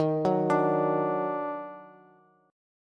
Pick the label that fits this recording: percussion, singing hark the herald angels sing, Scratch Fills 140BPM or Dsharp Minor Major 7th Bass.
Dsharp Minor Major 7th Bass